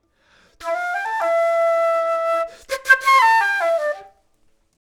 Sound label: flute; logic